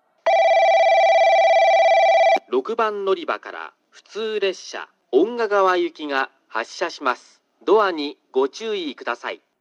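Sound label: local Ongagawa; kokura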